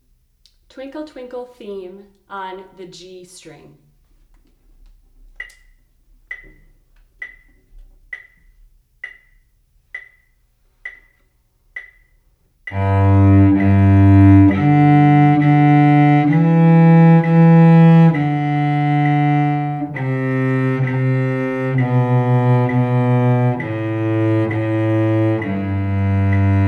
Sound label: Twinkle; Twinkle, Twinkle Theme in G; 1st position